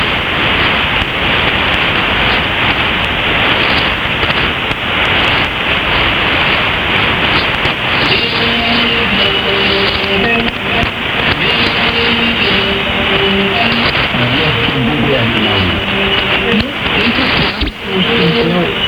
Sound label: BBN Radio open 15165kHz